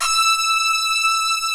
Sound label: SYNTH ORCH